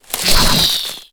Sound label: Boss Attack